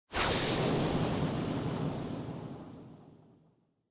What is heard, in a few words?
Motion Speed Lines High Long; モーション スピード線09-3(高 長)